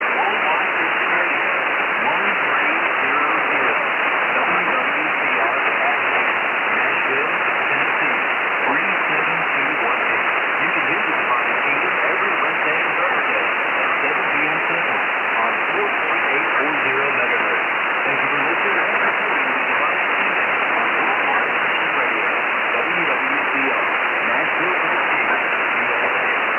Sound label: 4840KHz; WWCR